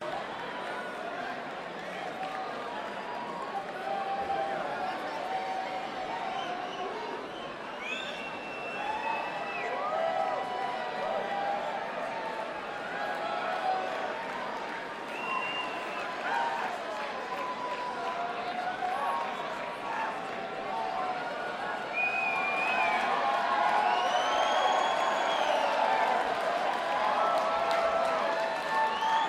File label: Crowd